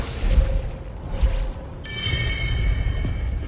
success; kena bridge of spirits

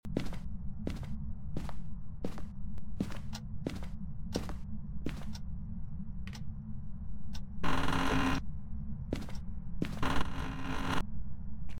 enviroment; Phasmophobia; gamesoundopedia

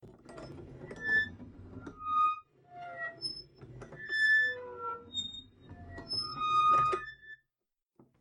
rusty hinge; Dark Souls III

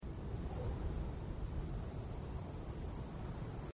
ambient; risk of rain 2

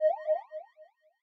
firefly; proteus

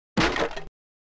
crate break; crash bandicoot

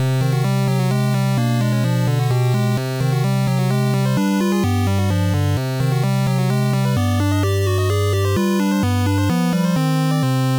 india music; 8 eyes

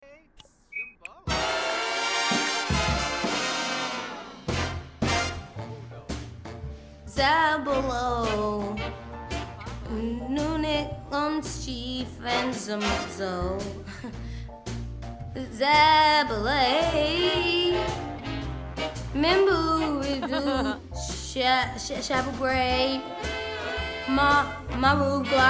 singing; sims 4; lvl1

